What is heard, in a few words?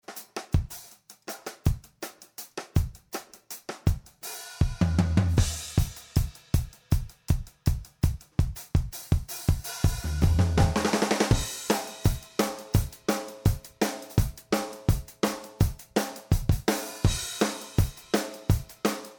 mpressored Drum Buss bypassed